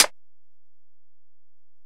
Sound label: Hit, Closed Hats